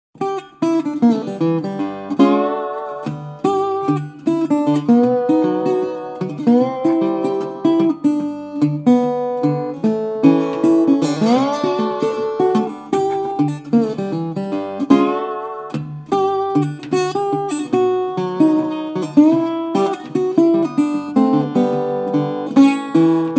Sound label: Sliding Rag